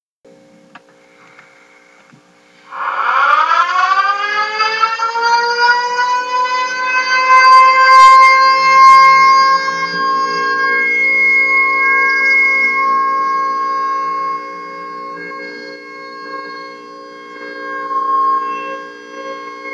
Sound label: Tornado Warning; fire department